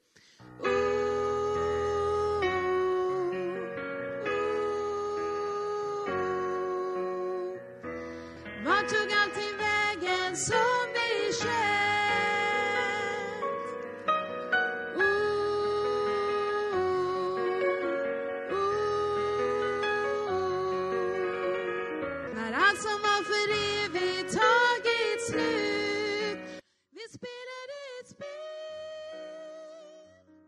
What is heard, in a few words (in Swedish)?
sopran